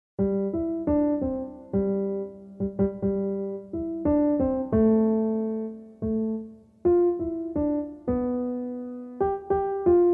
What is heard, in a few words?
Jingle Bells